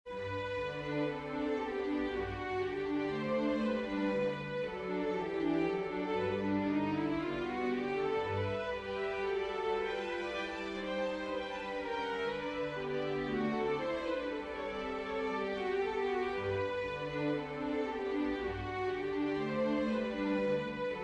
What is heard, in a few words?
for string quartet; burgmn ller johann friedrich franz; the spring